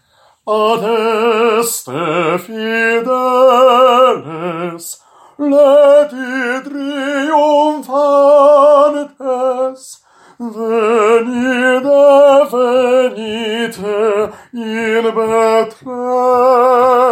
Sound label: Adeste fideles TENOR